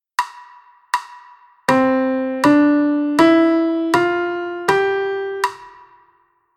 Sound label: dotted quarter